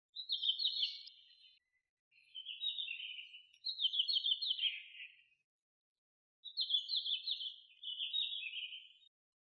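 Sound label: ruribitaki song, red-flanked bluetail; saezuri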